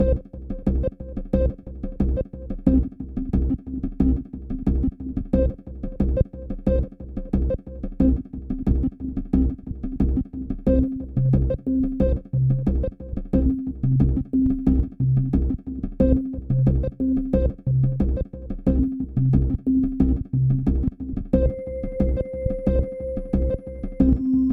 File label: busy bee, chiptunes